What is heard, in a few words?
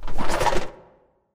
ballbot foley roll; player